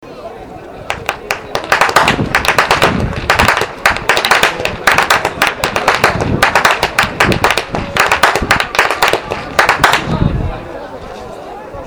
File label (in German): schnalzen, jugend; kothbruenning